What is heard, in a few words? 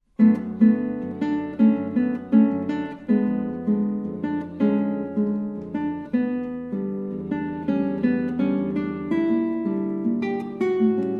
Samba, guitarist